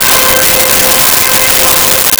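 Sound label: Brass Section C5; Nintendo; July 2020 Gigaleak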